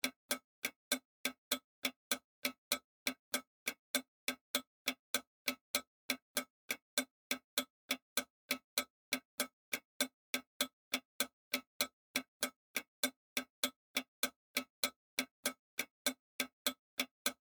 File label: Parking Meter, Ticking, Tic, Toc, Clock, Loop; Technology; Machinery